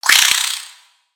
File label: Spider Angry; Lego; aronwk collection; ndaudio; creatures mechanical